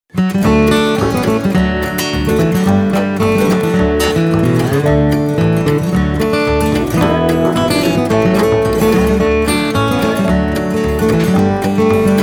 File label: Walking Tune from Granges